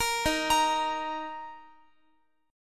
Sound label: Eb 5th, Guitar; JGuitar